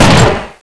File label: shoot; zp desert